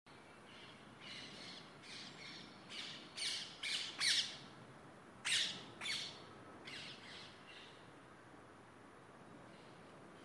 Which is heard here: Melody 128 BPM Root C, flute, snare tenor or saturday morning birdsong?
saturday morning birdsong